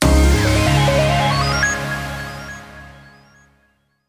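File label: jingle battle finish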